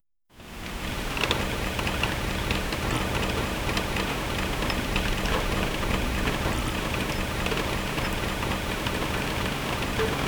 summer rain softly tappin; asmr

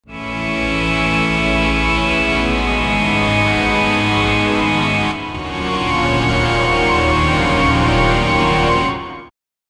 dark gothic organ music

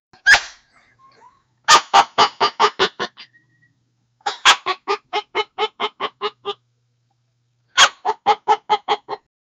ai dog laugh

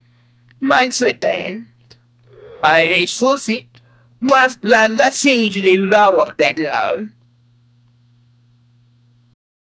task completed

